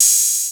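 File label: open hat, 808; musicradar-synth-samples; Classic drum machines; 808HATS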